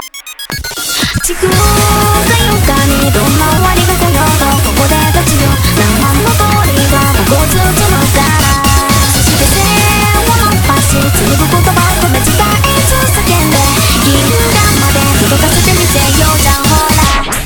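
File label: background music crossfade; Invaxion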